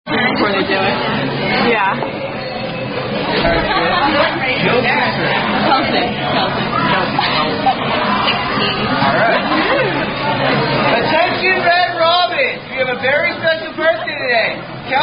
Red Robin Happy Birthday (Tune Alouette); Birthday Songs And Sundaes

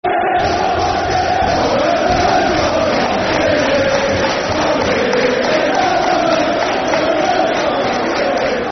When The Blues Go Marchin' In, Football Chants; Soccer Songs; Everton Efc

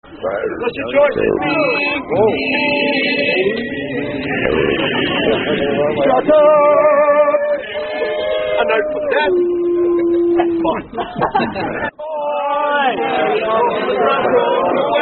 Unknown Hash Song

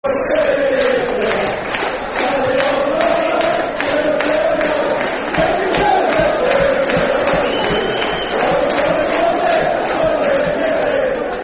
Swansea O Swansea [Football Chant (Soccer Song)]; Swansea City Scfc

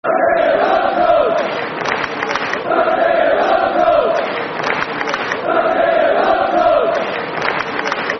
Football Chants (Soccer Songs), Liverpool; Xabi Alonso; Liverpool Lfc

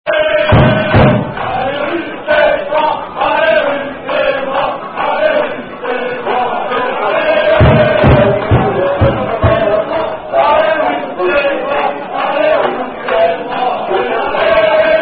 Chant, French Football Chants; Raf Vs Villemomble; Unknown French Football Chants

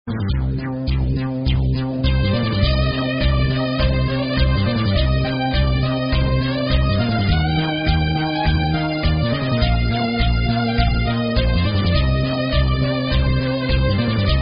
Electronica Dance Version

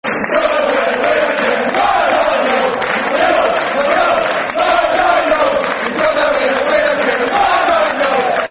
Football Chants (Soccer Song); Martin Jol's Got No Hair; Tottenham Hotspur Thfc

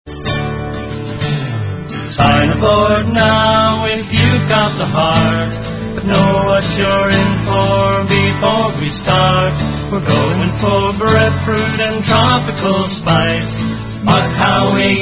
Horn; South Round Horn

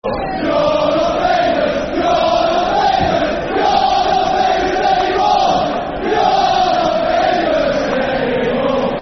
Football Chant; Bury; fanchants-com

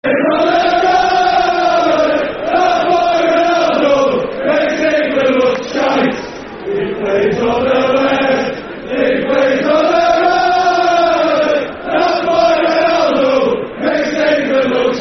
Football Chant (Soccer Song), Man United; That Boy Ronaldo Chant

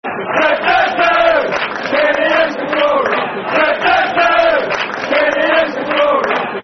Football Chants (Soccer Song); Zakora; Tottenham Hotspur Thfc

